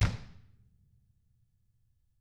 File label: DW FELT, Drums; DW KIT2